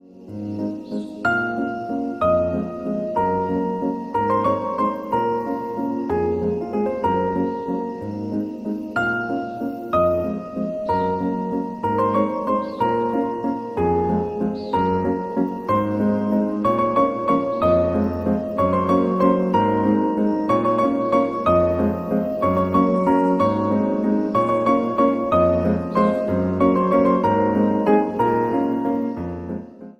idila piano ringtone

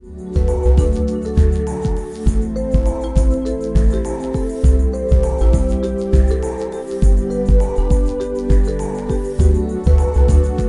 windows xp ringtone

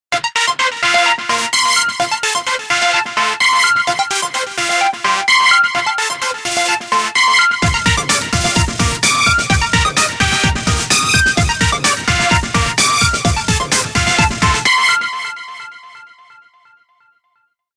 loud music ringtone; android

